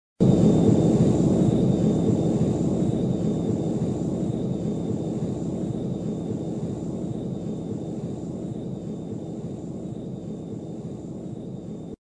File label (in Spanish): Cabin filtro; acustica; barrerasonido